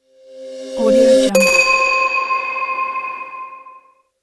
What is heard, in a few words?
impact glass